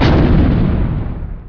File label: Hit Big Door; Test Drive Off-Road 3